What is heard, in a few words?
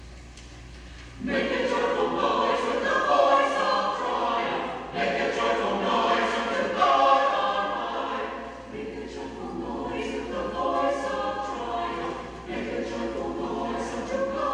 anthem